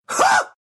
laugh, ru; dota2

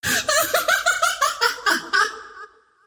wheel laugh; queen of pain; arc wheel

